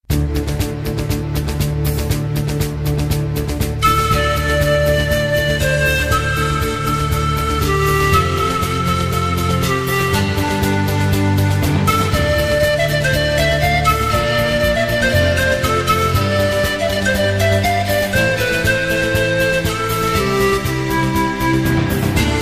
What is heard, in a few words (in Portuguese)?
Musica Indiana